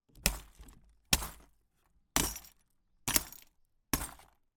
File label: impact hammer strike broken glass debris in cardboard box multiple times